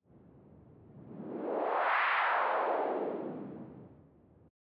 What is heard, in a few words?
wooshing wind